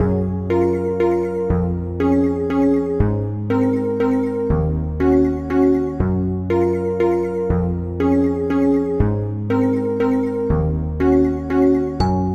waltz; purrfect apawcalypse patches infurno

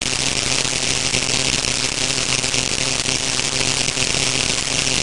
Electric

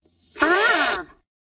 Trumpets wrong

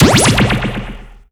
Hypnobeam Hit

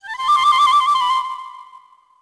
Scrolling Butterflies; lego my style preschool

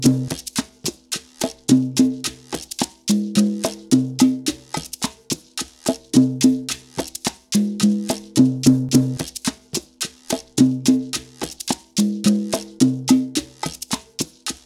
Salsa Loop 8 count; zumba fitness join the party; ps3; wii; xbox 360

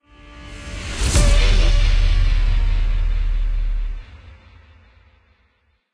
Character Stinger

